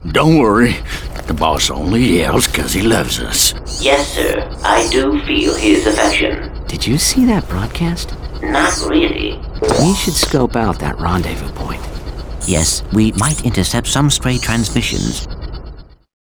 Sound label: Cutscene - Hoverbike Race Victory